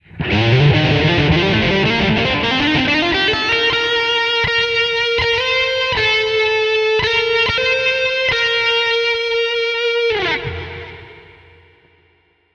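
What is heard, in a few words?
Win, game music; Project Reality BF2 1.6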